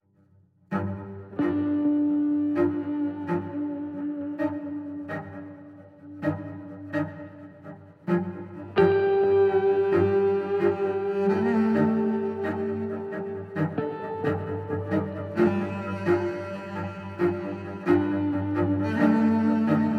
tension

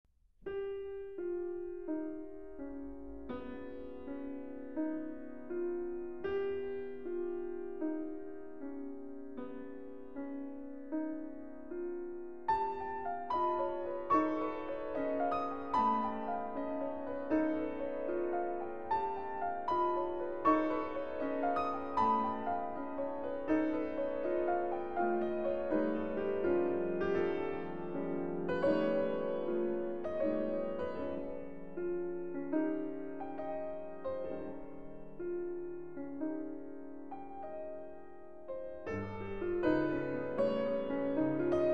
Cloches à travers les feuilles; Images

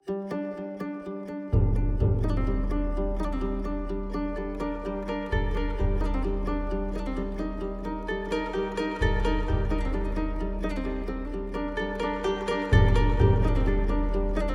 rythme infernal folk

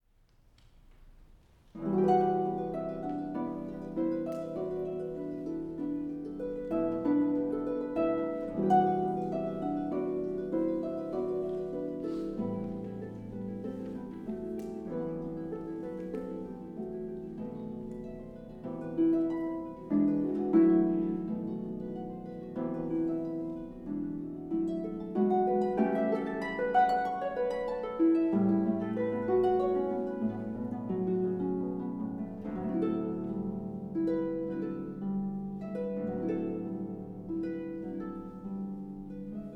Sonatine- 1 Allegrement

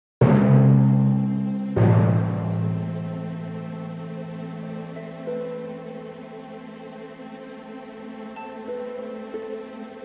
Classique

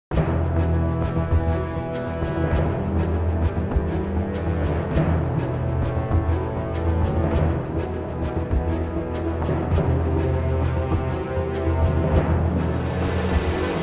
SURSAUT

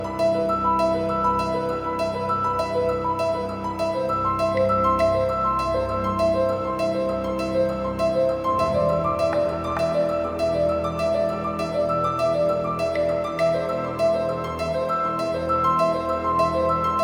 REPRISE ALLEMANDE

